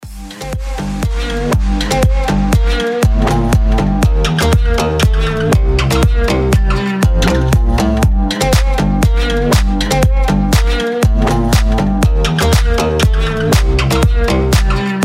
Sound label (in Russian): glubokij ritm